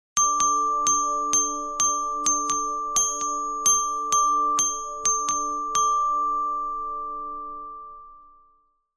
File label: nokia dynamic ringtone